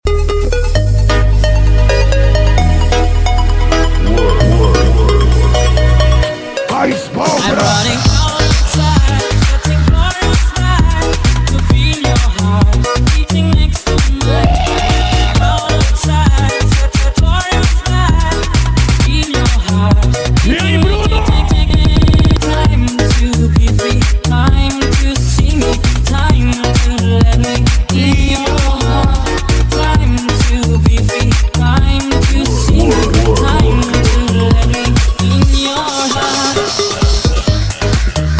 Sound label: Dance Comercial Remix